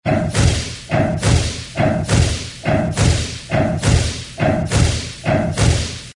heavy power pulse with whoosh

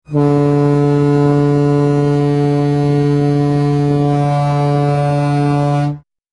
Queens Ship Alert; Hmy Britannia

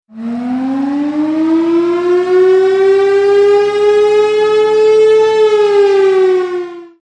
Nuclear Alarm

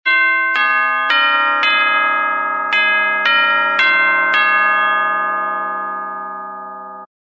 clocks tower clock fast chimes

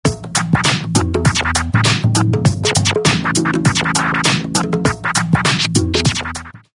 tablas and double bass scratch

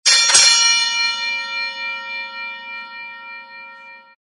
bell fight double ring

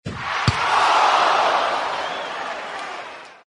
soccer goal post hit; sports

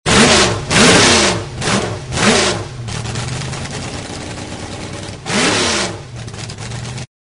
tractor pull engine revs

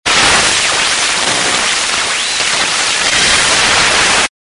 shortwave static

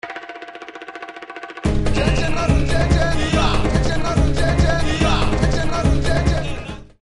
African Congo

